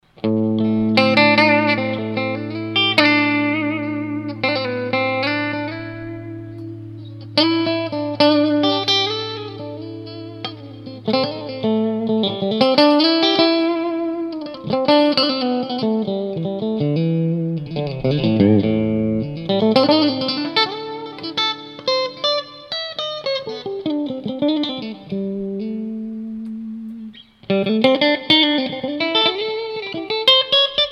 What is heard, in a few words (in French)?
5 positions clair gratouille, guitar